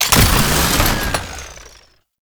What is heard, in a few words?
Chain Saw Tonfa Stack Buff Drop